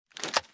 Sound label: reload lift; gmod; viper